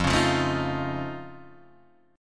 F Augmented 7th F Guitar Half Step Down, chord

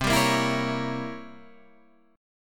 C 7th Flat 9th C; C7b9 strummed; JGuitar

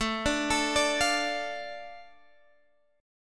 A Suspended 4th Sharp 5th down Guitar Open G

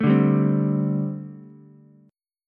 G Suspended 2nd Suspended 4th, Ukulele; Gsus2sus4 strummed; JGuitar

